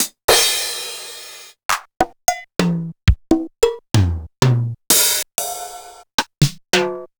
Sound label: Roland TR505